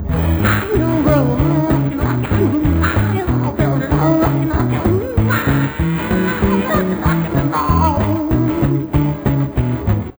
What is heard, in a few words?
Ringtone; Smeshariki Museum of Communications